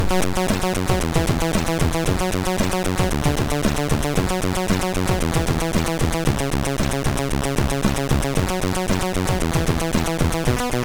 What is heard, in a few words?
Spectrum tune MOS6581R2